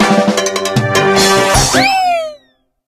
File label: reward stinger